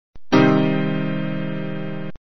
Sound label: minor triad